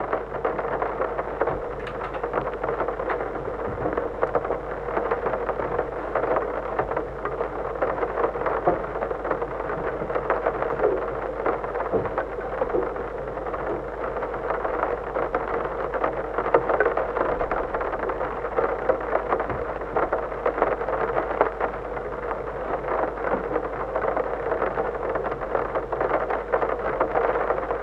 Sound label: Sleep Sounds Rain